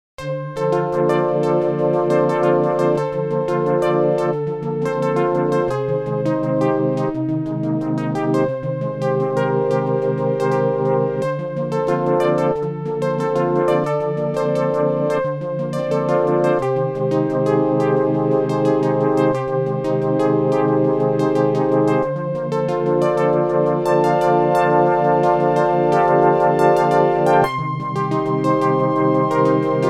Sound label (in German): Pad mit kurzer Attack